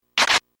snake brawl

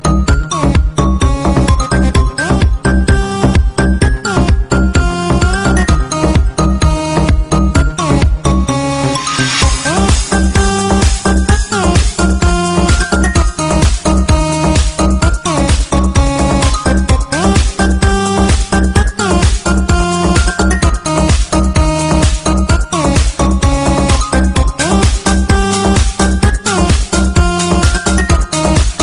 apachi dance turkish

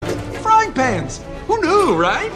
Frying Pan

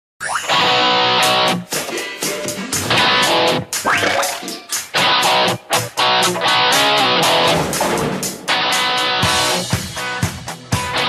Rock; Masha Bear

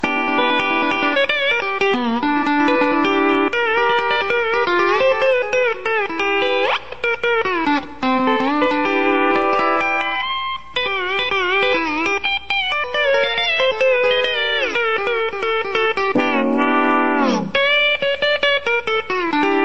music

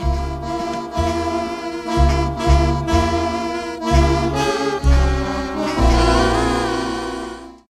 Nightmare Bells

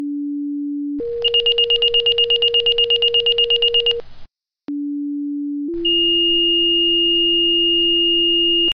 SIREN

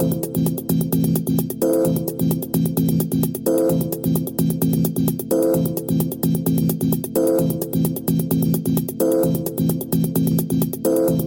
Techno

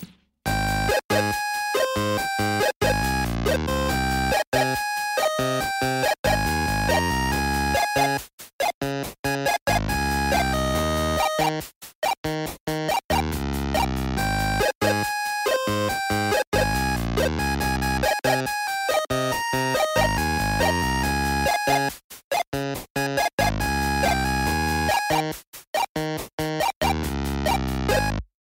Rocket ship 8bit

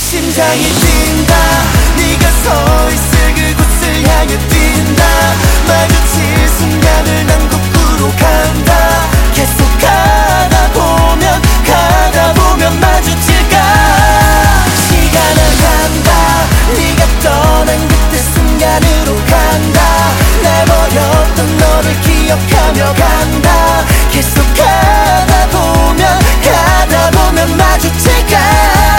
Beat kpop

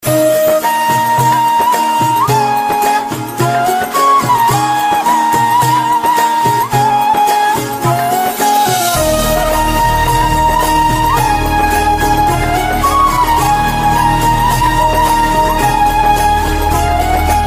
Serbian Etno

